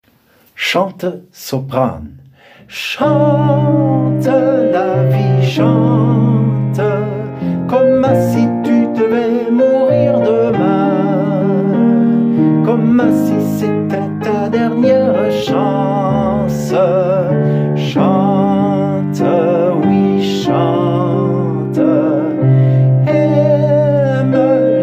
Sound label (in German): Sopran